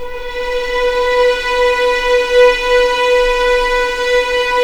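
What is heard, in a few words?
B4 legato violin, left, The Ultimate String Collection; East West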